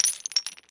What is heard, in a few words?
football target tap; sfx sports